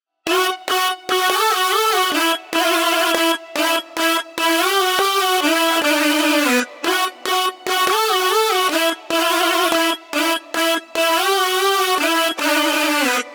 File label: Drop Leads, 146bpm; Remix Stems